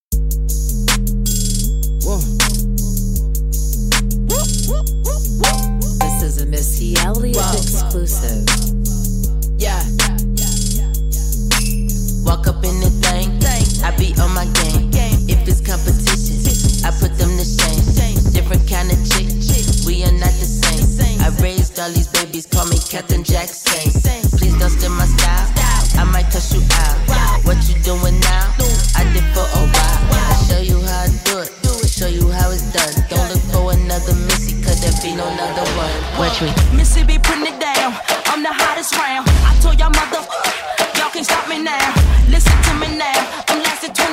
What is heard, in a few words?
HipHop